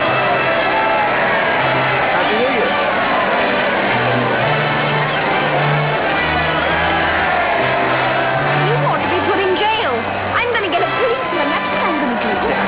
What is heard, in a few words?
kiss